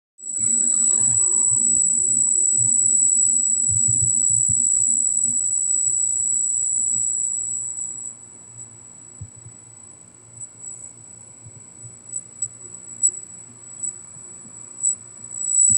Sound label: noise